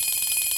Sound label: count Up Loop Shorter; riches of midgard